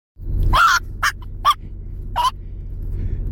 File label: Chihuahua